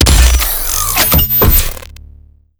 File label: gauss fire; doom gauss